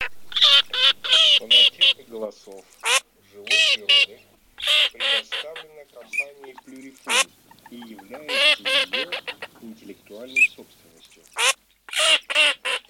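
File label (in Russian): Чирок-свистунок Alzavola, манок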